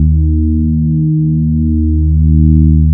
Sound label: VAGUE D#2, BASSES; Best Service Alpha Dance vol.2